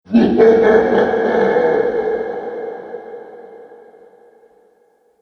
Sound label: Monster Boss Laughing; horror